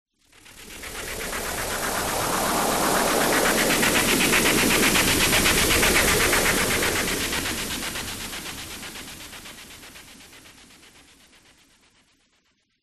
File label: sound design sweep slow noise stutter filter mod